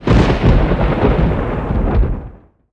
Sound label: thunder, ambience